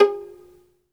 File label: SOLO VI, Synclavier Strings; ILIO